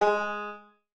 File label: Banjo Note; Bendy: Lone Wolf